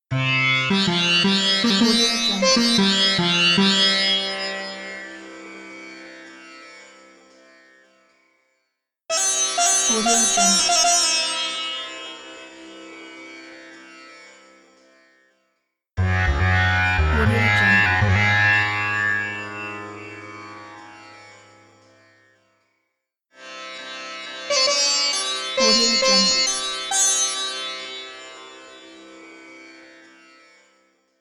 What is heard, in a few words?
indian sounding intros